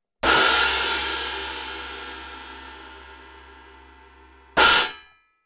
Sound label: FX ISTANBUL AGOP TRASH HIT 14, CYMBALES